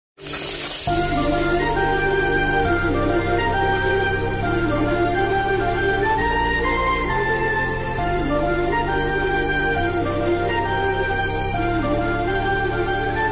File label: Instrumental